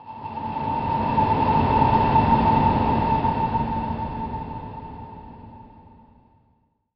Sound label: Title Transition Ghostly; Production Element